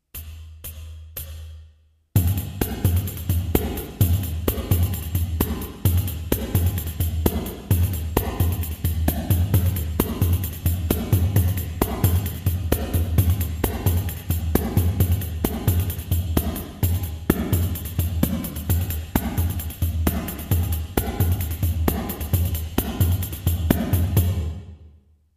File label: Groove, pandeiro; rhythms; Groove 6